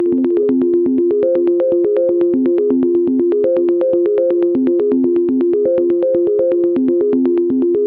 122 BPM Arps C maj A min Tonic F; arp essentials; Flux Arps

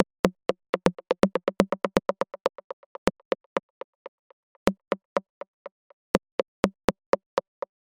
122 BPM Rims; MMB Studio Soundtrack Essentials

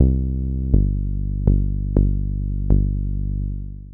122 BPM Bass Synth A maj G min Tonic G; Pro Bass Synth Studio Kit; MMB

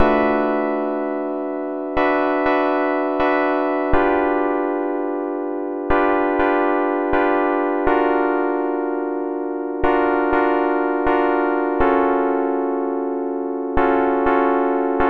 122 BPM Tonic F Dmaj Bmin Chord Progress; mmb chord progressions essentials

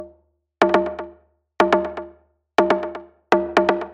122 BPM Tubos; mmb studio melodic

